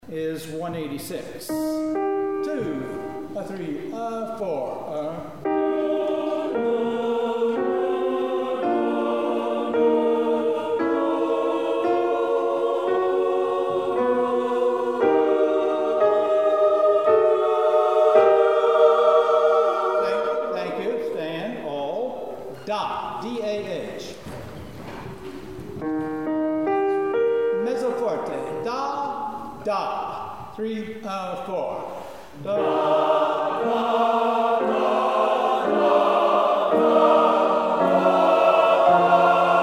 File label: learning; rehearsal files